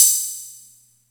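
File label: Atlantex Music Percussion Computer hi-hat open; Atlantex MPC-1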